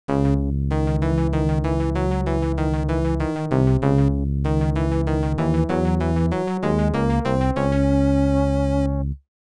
ファンファーレ 戦闘・敗退; Nobunaga's Ambition Tenshouki; PC-98

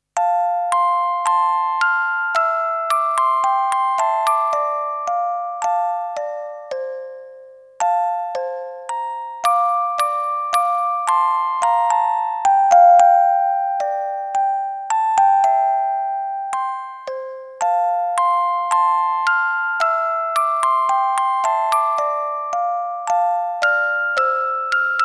メイドより愛をこめて オルゴールver.

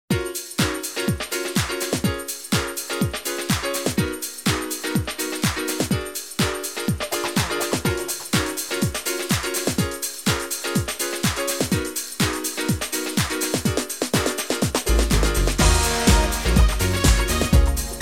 (karaoke); Idol Bouei Tai Hummingbird Sisters

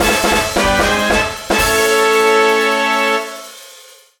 終幕のファンファーレ; River City Melee Mach; PS4

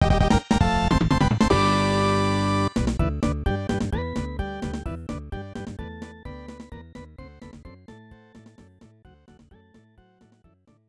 Shouri no Fanfare; Super Momotarou Dentetsu II; SNES

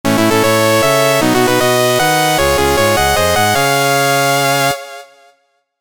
勝利のファンファーレ; yuusha to maou to majo no cafe

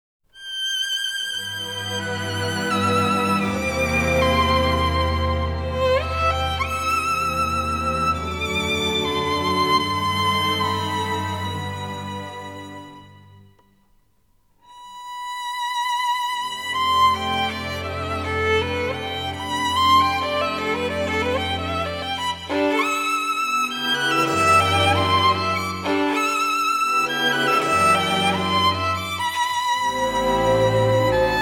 namida no violin; Chou Jikuu Yousai Macross; Macross Vol.I

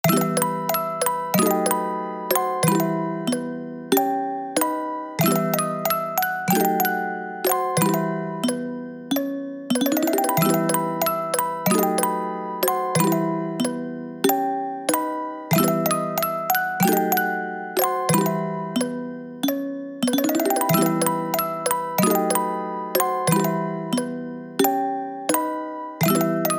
オルゴール; Osawari Tantei Ozawa Rina Original Soundtrack

